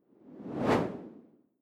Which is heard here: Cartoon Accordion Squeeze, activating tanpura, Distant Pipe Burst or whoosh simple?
whoosh simple